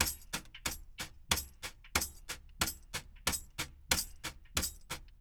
BRSH TAMB; Spectrasonics; Liquid Grooves 1 & 2; TAMB+TRIANGL

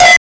COWBEL DIST, PHUZZ DRUMS; Spectrasonic Distorted Reality 1

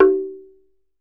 TUNG HI, African Perc; E-MU Producer Series Vol. 7 – Old World Instruments

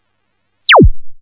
ZAP, ZAPS THUMPS; Best Service Alpha Dance vol.2

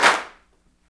CLAPS, Africa Handclaps

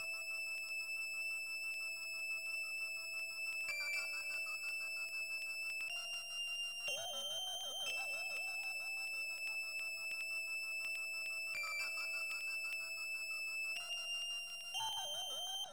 SYN, Hip Hop and R&B; Best Service ProSamples vol.02; AKAI

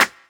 Clap; Equipped Music; Loungin House

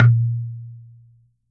coconut; Unique Instr

